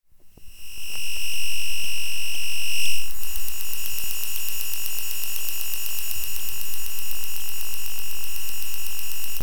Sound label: Laptop glitches and noise